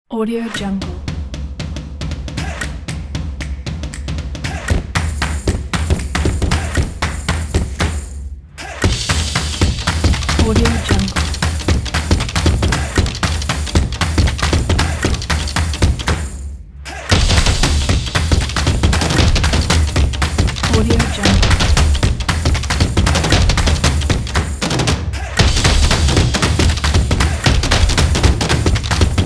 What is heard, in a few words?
a drum